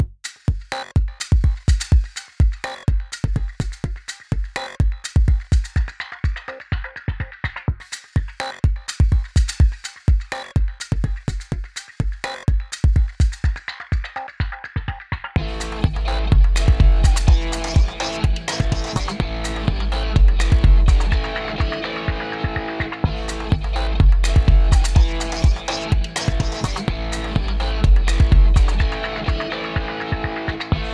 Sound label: Asian Something; Kung Fu Fight; Wii U